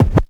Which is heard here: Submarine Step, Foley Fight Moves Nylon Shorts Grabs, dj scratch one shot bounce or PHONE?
dj scratch one shot bounce